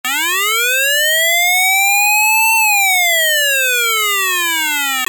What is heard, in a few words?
wail